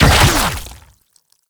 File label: Laser Discs Proj Return Shot; Infested Lich; DJ Rom